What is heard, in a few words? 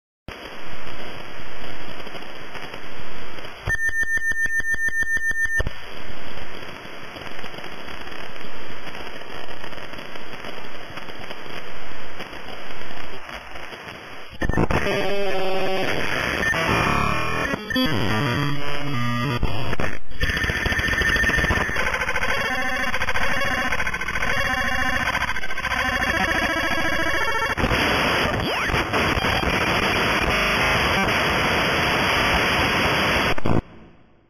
Signal; ArirSat